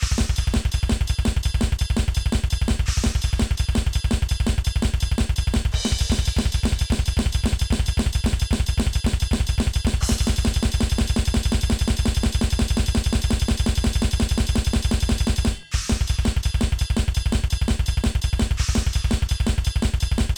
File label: Drums 84 112 BPM